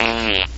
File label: fart medium